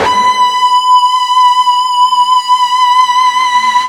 FUZZ B 4 L, Guitar; East West; Steve Stevens Guitar Samples Collection

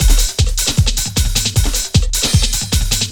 LOOP, Eurotech; Sounds Good

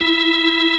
B3 E 4, organ; AKAI S-Series CD-ROM Sound Library VOL-1

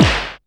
kung fu

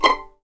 C6 PIZ MF, string; East West; The Ultimate String Collection

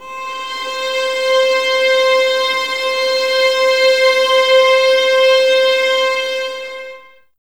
Violins Sul Ponte, String Ensembles; Miroslav Vitous; 11 Violins

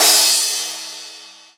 Crash, Cymbals; Vengeance; Essential Clubsounds Vol.4

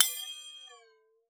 WATER, PERC; Xsample VOL-9

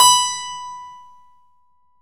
KEY HRPCR, Roland; Roland - LA Composer vol. 1

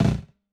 SNARE BUZZ; JUNGLE BEATS; ELECTRO KIT

